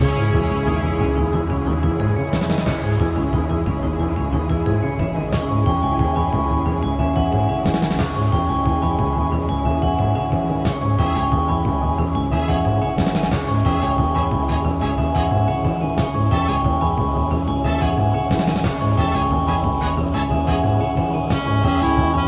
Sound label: hard boss